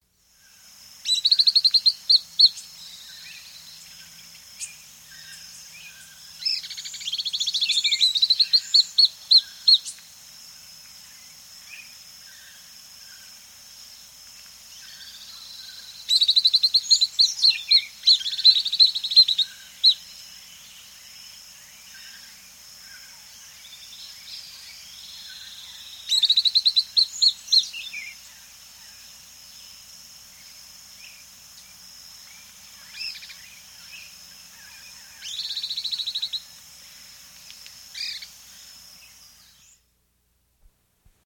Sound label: White-Ringed Flycatcher, S. America